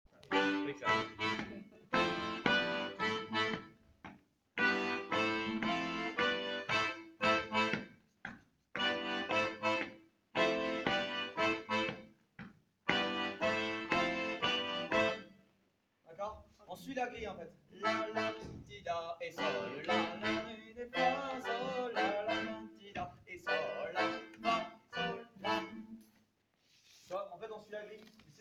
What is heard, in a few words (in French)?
grille marquage diatos, Cercle circassien; Megastive; Musique d-ensemble